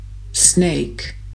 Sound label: snake; animal